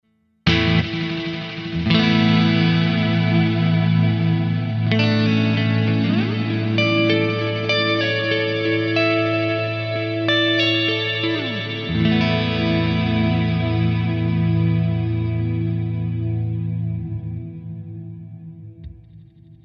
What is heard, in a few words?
Eventide Ultratap Delay